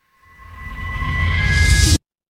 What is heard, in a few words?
transition riser